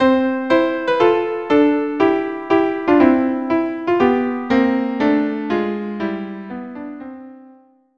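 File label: violin viola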